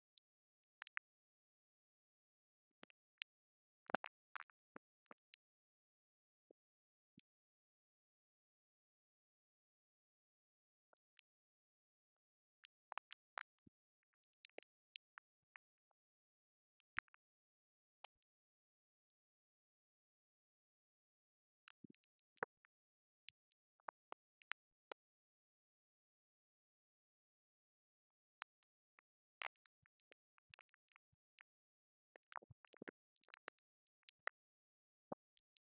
Audio Clicks Only mouth noises, swallows, and mouth clicks